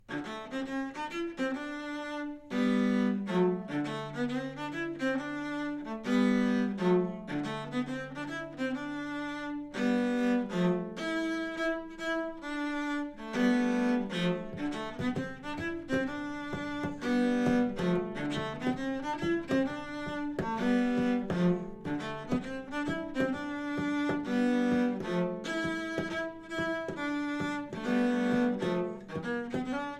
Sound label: Cello Trio